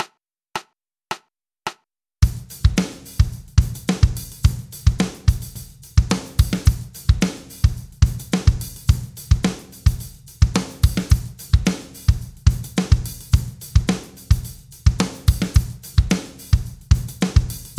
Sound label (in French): Batterie